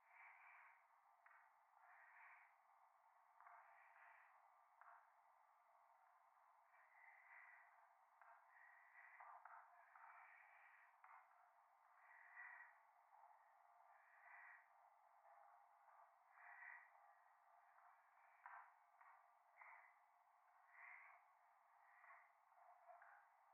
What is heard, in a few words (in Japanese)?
左耳 密着 吐息 電車; 夢の中